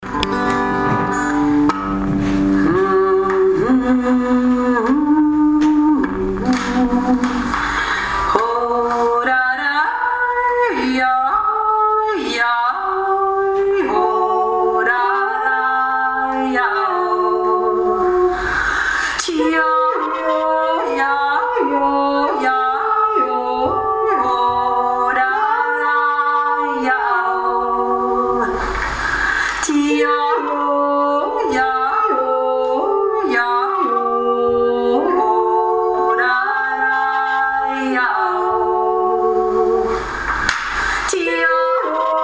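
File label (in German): henndorfer zweistimmig, jodler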